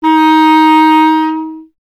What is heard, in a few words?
Clarinet D#; Miroslav Vitous; Solo Instruments VOL-1